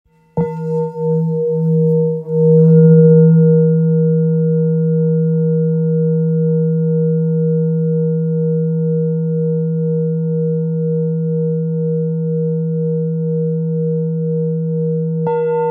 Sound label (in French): Bol grave conque 1.2kg mi; Bols Chantants